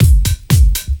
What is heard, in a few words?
4 floor beat, trance